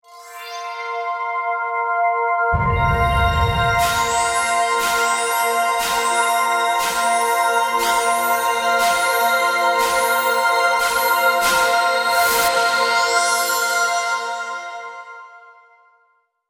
Powering Up; Paper Mario - The Thousand-Year Door (GC DSP)

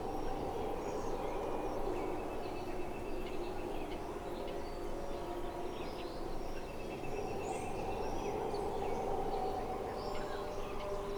ambience; Escape From Naboo; Lego Star Wars (PC)

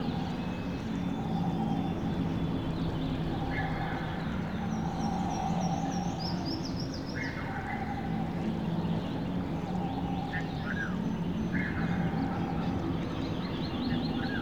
ambience; Defense of Kashyyyk; Lego Star Wars (PC)

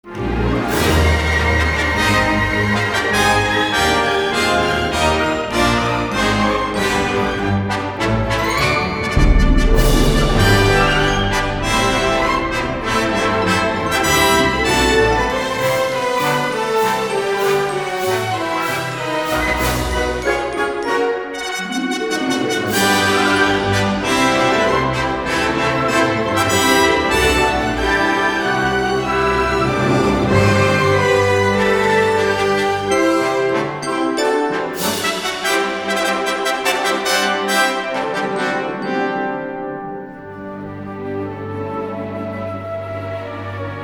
Victory, Music; Star Wars Galactic Battlegrounds

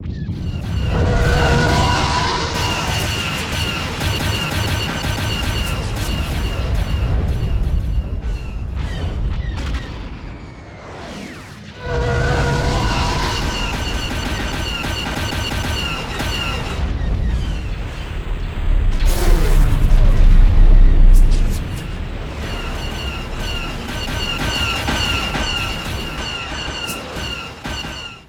ambience; Battle Over Coruscant; Lego Star Wars (PC)

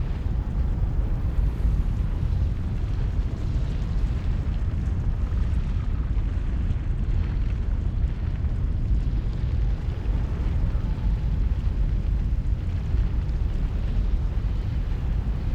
ambience; Darth Vader; Lego Star Wars (PC)

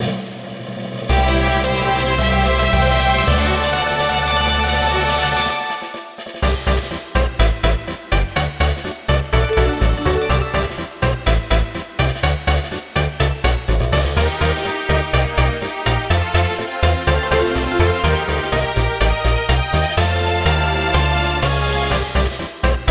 Level Up; Paper Mario - The Thousand-Year Door (GC DSP)

